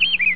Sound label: backgnd bird; Tweety Sounds